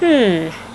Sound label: PONDERN; gemiti esclamazioni mugolii